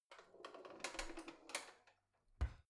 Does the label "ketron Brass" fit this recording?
No